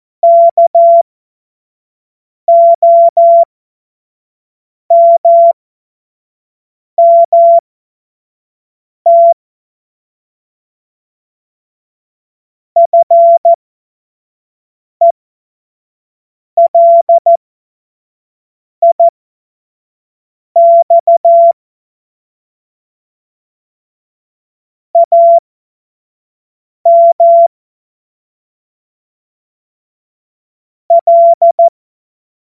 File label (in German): Witz, Morsekurs, 25 F70; Witze